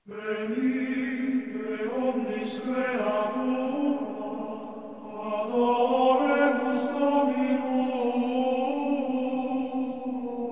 Ambrosianischer Choral - Officiorum - Antiphona, Gregorian chant; Antiphona duplex; Venite omnis creatur